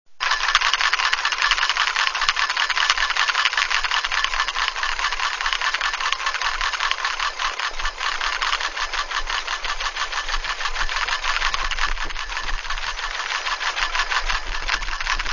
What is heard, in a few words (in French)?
pailles